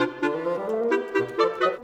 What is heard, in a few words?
Winds, Orchestral; Sonic Foundry (Sony Creative Software); Orchestral VOL-2; Modern 23